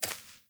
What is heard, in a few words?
Sparrow Step, Footsteps; Animals